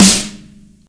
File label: prominent snare sound g key